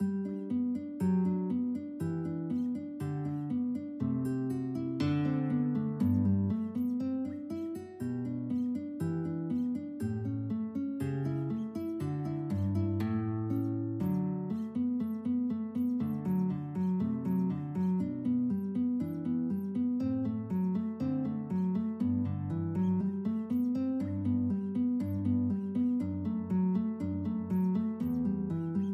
guitar duet

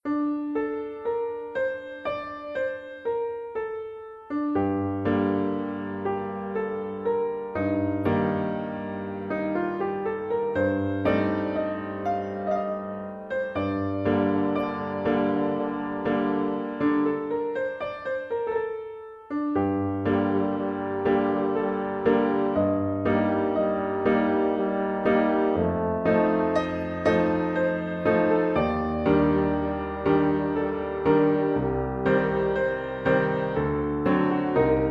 E lucevan le stelle, french horn

